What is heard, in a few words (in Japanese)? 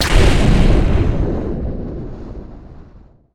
【爆発】爆発; 効果音ラボ